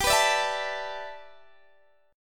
G 6th Add 9th G, Guitar DADGAD; down

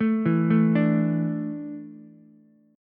D Suspended 2nd D down Ukulele; arpeggiated

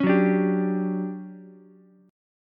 D Major 11th Ukulele C6; strummed

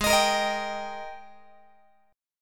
Ab Major 7th Suspended 2nd Ab down Guitar; AbM7sus2 strummed; x,16,18,20,19,18

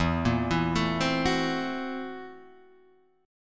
Fsharp 7th Sharp 9th Flat 5th, guitar chord

